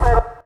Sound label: dog